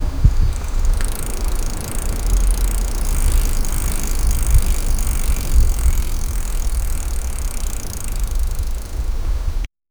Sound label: bicycle movement sound